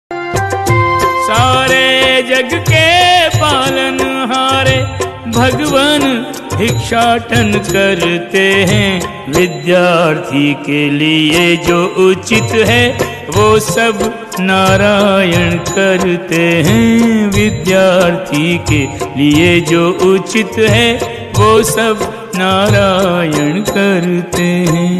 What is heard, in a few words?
song ringtone bhajan; ramayan